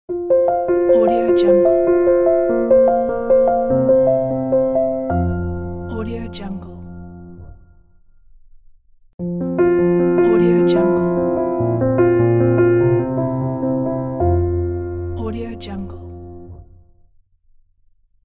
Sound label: piano logo